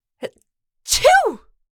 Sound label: Adult female sneezing